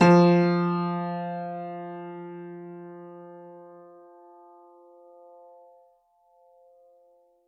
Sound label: Grand Piano, Kawai, Sustains, F2; Chordophones